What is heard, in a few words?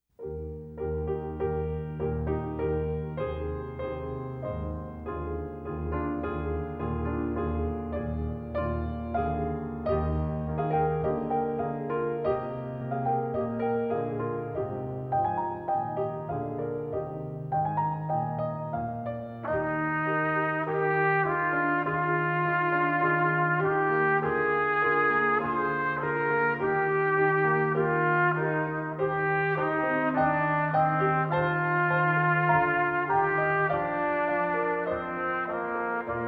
Sheep May Safely Graze performance, Trumpet; canadian brass easy solos